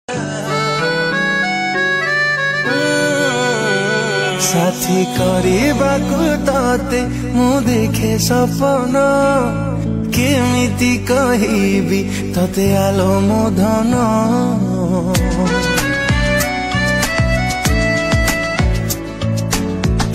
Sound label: song odia love song odia dj